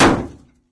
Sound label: door impact; ambient; source engine; episodic; intro